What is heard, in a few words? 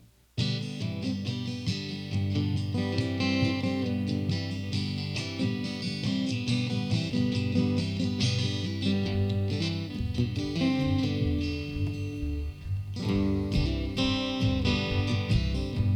Squeeze Box